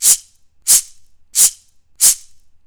MIRACA, Indian Traditions; USB Soundscan vol.45; AKAI

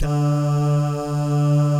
DAH, Vocal Jazz; ILIO

